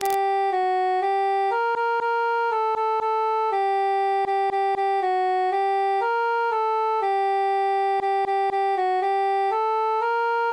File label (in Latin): cantus solo; la la la